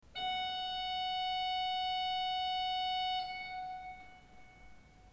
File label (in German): ton, orgel